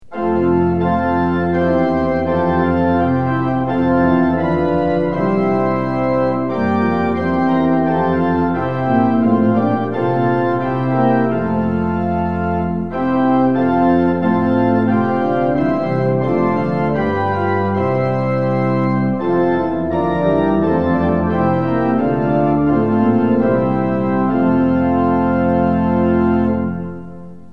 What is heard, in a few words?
tune; hymns; lillenas